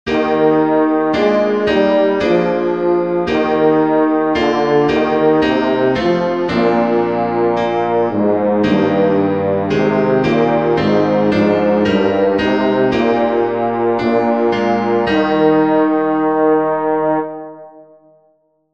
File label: coena domini bass; Draw Near and Take the Body of the Lord